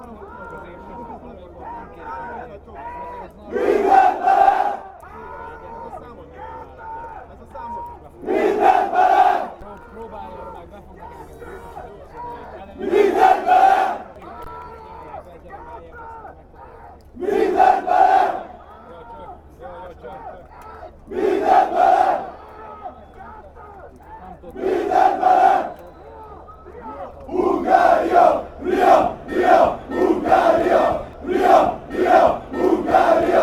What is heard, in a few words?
shouting chant